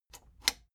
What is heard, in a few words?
plastic button latch scratch disable slow